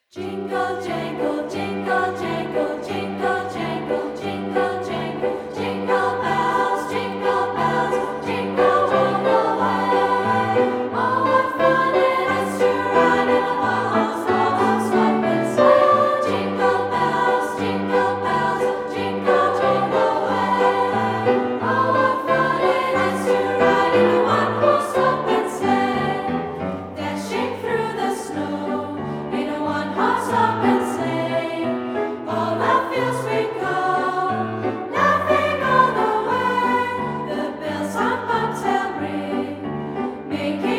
Pigekor Minikor, Jingle Bells

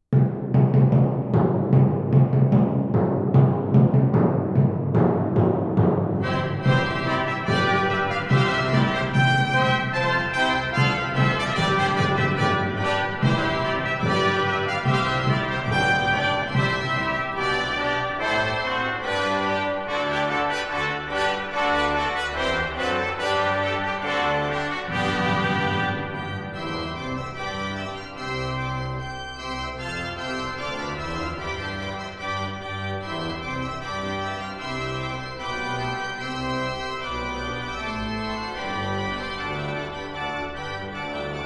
Fanfare Orchestra, Marche

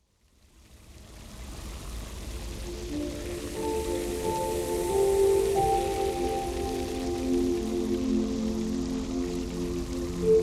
Dulled Noise Binural

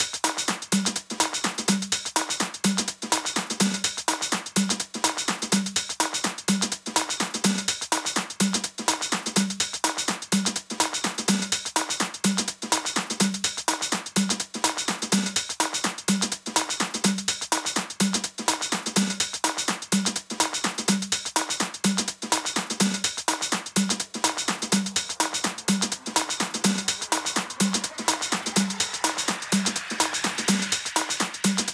Latin, Beat DJ Tool

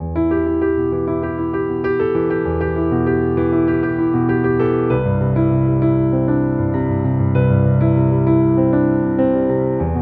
Piano

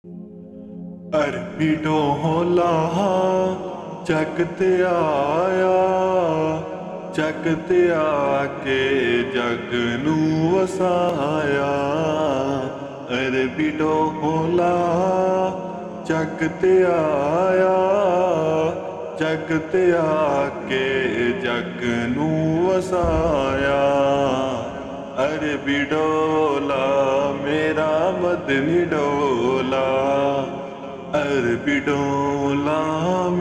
Lofi Version

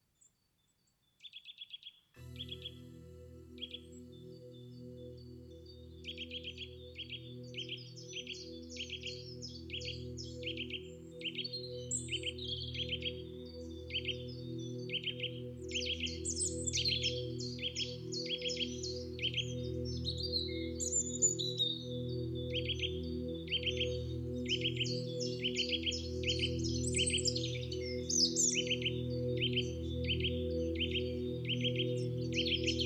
Zen Forest

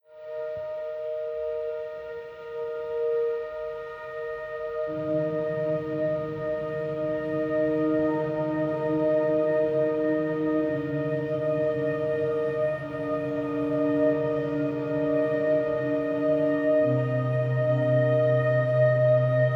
Jazz Music Therapy for Cats, Sleep Music

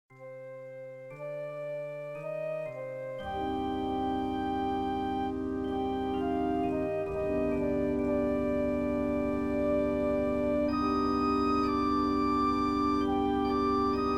Sad Organ

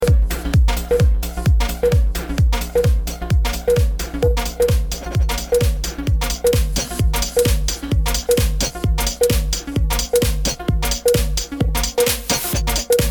Live Mix at Dance Bar; Nsk

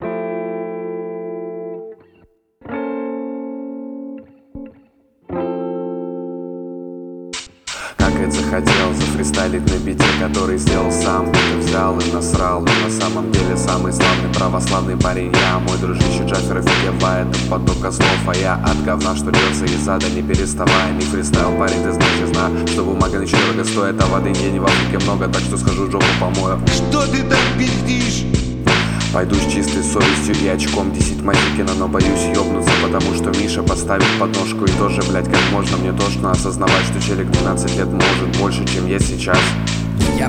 Boom Bap

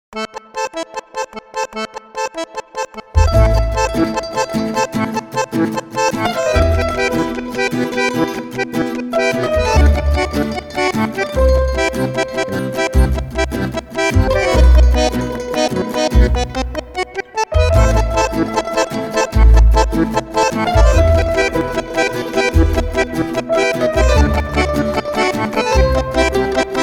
Ballroom Dance Orchestra - A Little Tango

